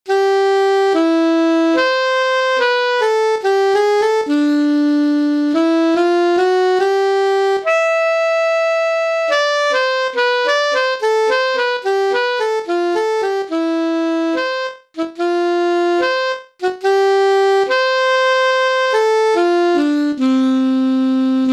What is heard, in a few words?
Alto Sax slow, etudes; Eb ALTO SAX Lyrical; Region 23 Middle School Etudes for 2026-27 (Year C)